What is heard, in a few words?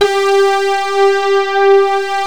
OUI G3; M1+D50